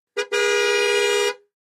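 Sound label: Aston Montego Horn Blow; aston montego ext. horns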